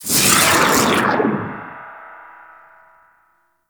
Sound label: Slow Trap Avatar Enable; Warframe; Traps; Orokin